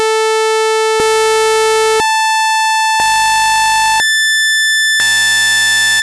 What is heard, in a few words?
Sawtooth aliasing demo